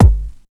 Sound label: kick dance, techno trance drums